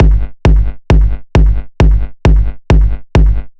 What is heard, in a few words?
KICK; Locked Grooves; Fleek